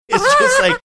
cute laugh

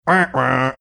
mimicking uh oh drop

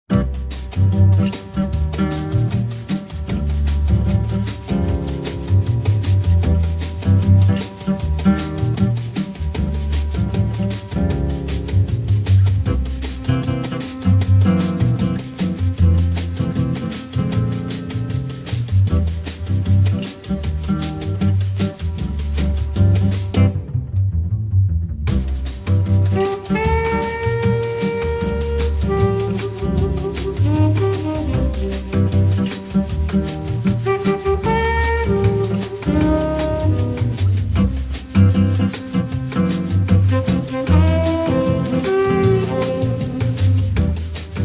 bossa nova hold music

